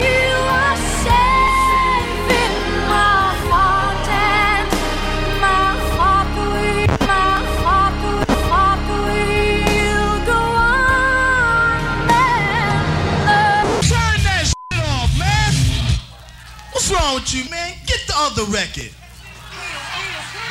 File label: Fart